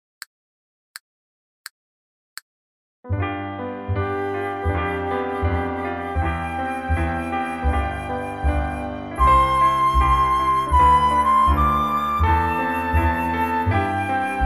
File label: C play flute reference